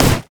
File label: ancient golem basic attack; monsters; LoL